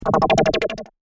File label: Laser Beam Tremolo Pulse; Laser Sound Sound Effects; science fiction sound effect